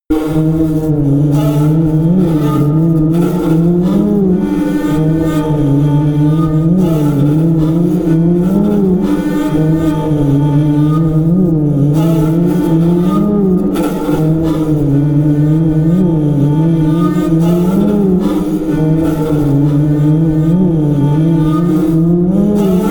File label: drone erratic didgeridoo high